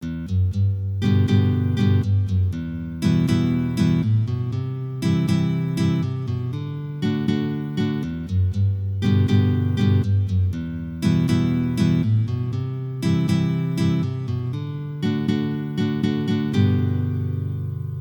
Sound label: chord change walks, guitar